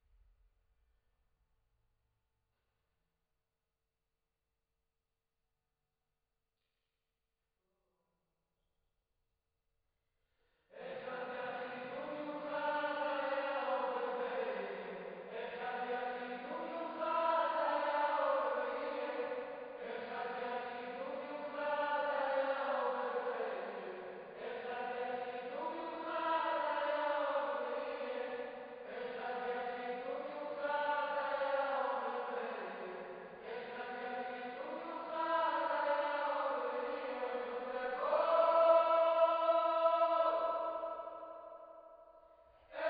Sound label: Choir